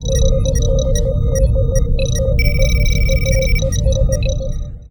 ambient bridge, computer

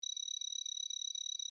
scr scroll, computer

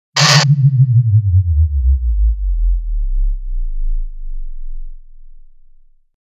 dilithium burnout; TOS

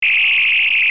phaser

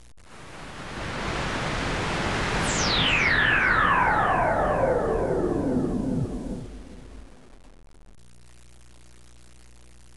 powering down; TNG

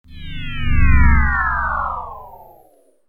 forcefield powering down, brig

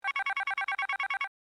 alert, computer

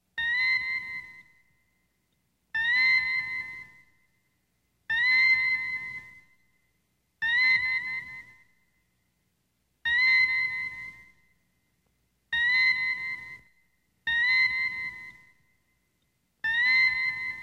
sickbay scanner clean; TOS

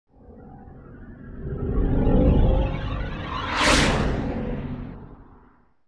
borg flyby

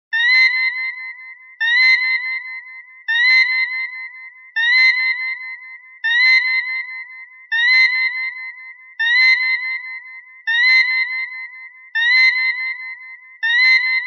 heartbeats; medical devices; TOS

